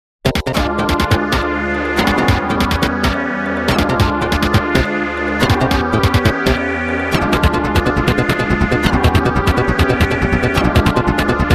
tension, jingle

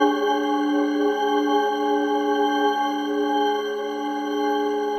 fear; animal

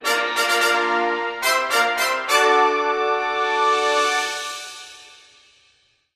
fanfare, jingle

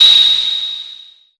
freeze

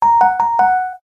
quiz right

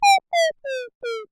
feeble

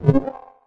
spaceship door

